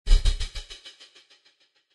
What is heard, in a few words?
Alert Sound